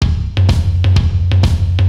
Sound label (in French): Rythme